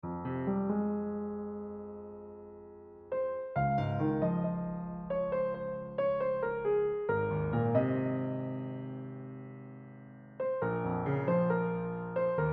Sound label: Piano Solo; Love Aaj Kal